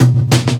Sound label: tom skip; Drums; One Shots; Slate; AURORA Sample Pack